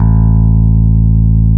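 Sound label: PEAKS BASS A2; PEAKS BS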